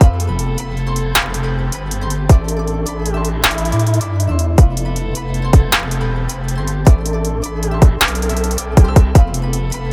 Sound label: Modern Pop Hits; Big Fish Audio; Pop Life